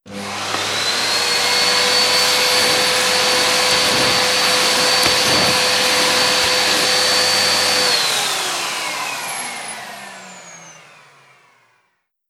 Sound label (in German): Staubsauger; geraeuschebingo